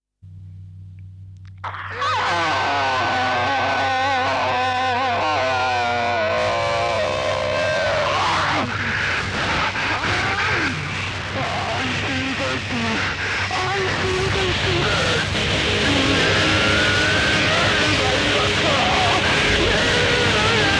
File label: BLUES